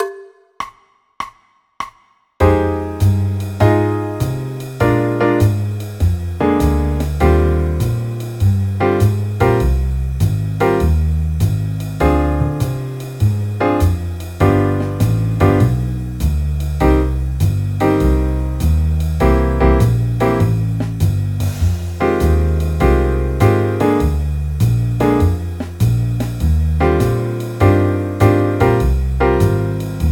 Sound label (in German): c moll akkordverbindungen